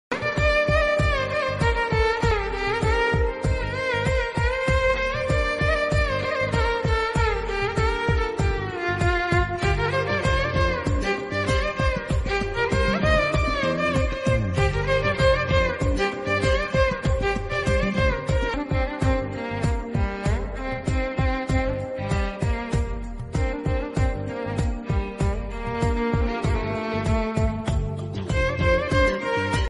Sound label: bgm ringtone telugu tamil ringtones bgm; kirrak party